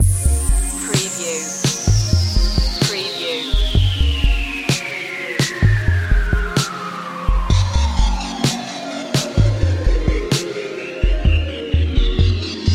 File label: soulful ballad loop